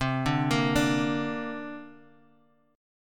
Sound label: C 7th Suspended 2nd